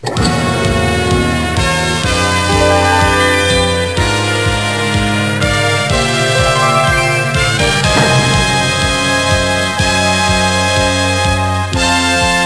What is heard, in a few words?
ringtone